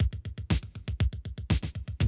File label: Classic Drum Loops